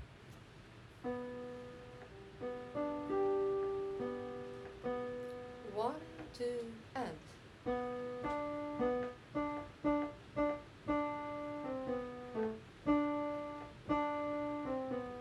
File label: Tenor Regina Caeli, choir; OLL choir